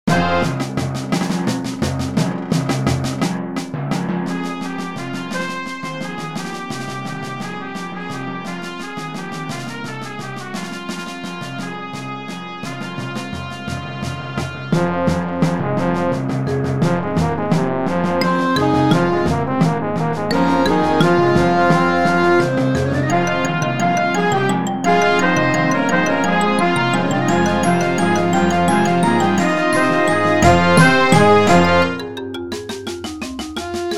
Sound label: tribal dance